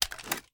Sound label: reload empty shake; gmod